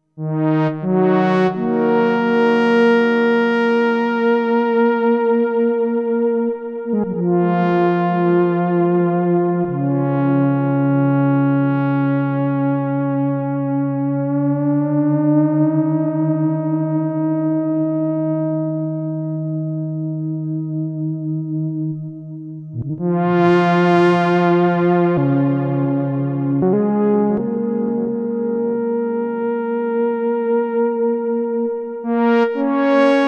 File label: A8 Soft